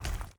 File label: Walk; portal quest android